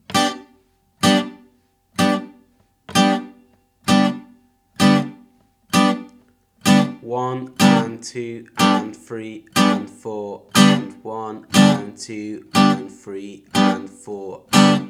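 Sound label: Strumming Pattern Reggae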